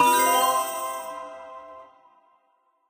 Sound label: show Prize